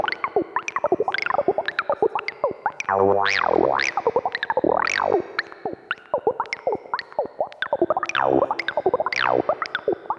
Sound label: dry; TWP Joy